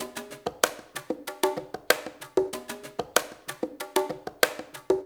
ABO CONGAS; Spectrasonics

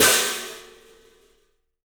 CYFX, EFFECT CYMB; 3D Sound; 3D Drums

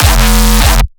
bass hit Malfunction F, Bass Stabs; Virtual Riot

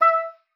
E5, OB D.AMORE; Xsample VOL-7

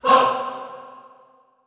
HAH C3, Classical Choir, SHORT HAH; Best Service

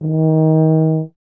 Tuba, Solo Instruments; Miroslav Vitous - Solo Instruments VOL-2

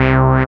SYN 202, MC-202; Sound & Vision - Gigapack I CD 2

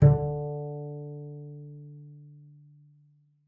D3, bass pizz; Xsample VOL-5

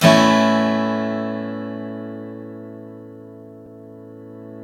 Dual Ac Chd; Club-50 - Foundations Roland

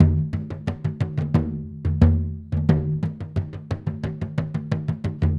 Bodhran, Celtic; Sonic Foundry (Sony Creative Software); Continental Drift Aftershocks

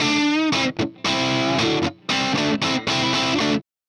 Riff Guitar, Indie Rock

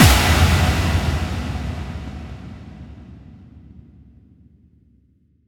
FX Kicks

